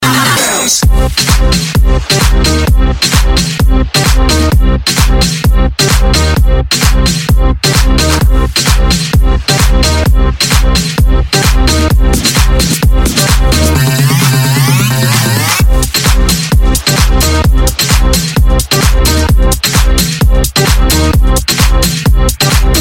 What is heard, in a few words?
iphone ringtone